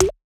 Percussion; FLPRO

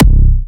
Harder C4, Big Room Kicks

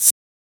Shaker

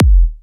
Kick, Kicks; FLPRO

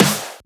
Snare; FLPRO; Snares

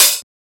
Open Hat; FLPRO

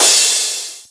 Crash

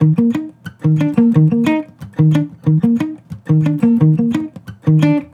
Acoustic Melody Loop - 180 BPM Eb Maj; Cymatics

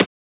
Transient; FLPRO

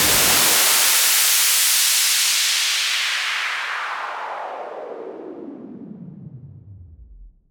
Fall Clean, Falls

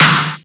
SnareClap; FLPRO

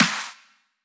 Drop Clap; FLPRO

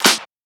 Shifted Clap, Claps; FLPRO

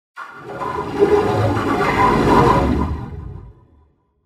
in Horror Pad, Vocoder

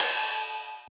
Ride; FLPRO

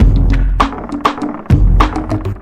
Loop

